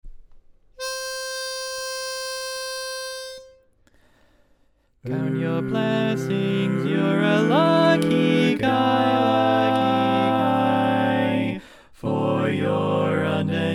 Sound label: Bari, barbershop tags